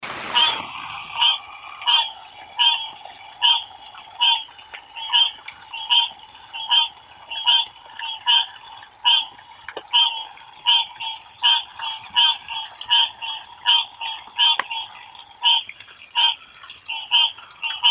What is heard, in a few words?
Green Tree Frog Quacking